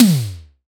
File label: tom 80s